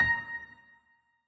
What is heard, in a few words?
piano; osu